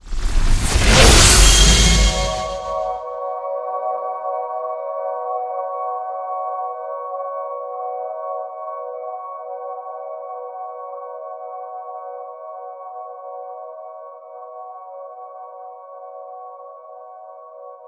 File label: wing gun loop